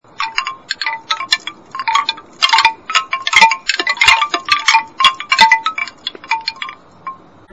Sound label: Wood